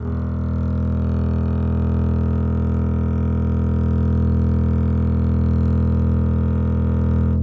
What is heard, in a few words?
test bass